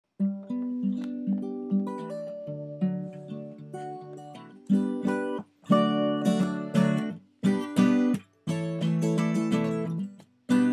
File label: Lullaby Guitar Solo